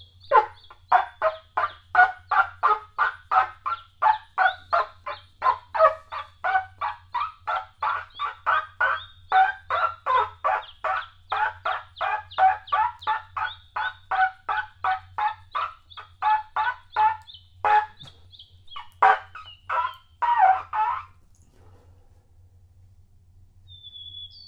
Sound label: bird